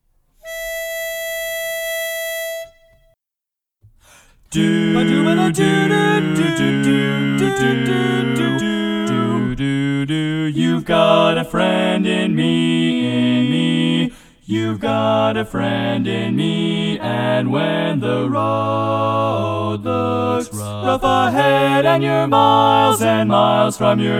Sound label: lead missing in E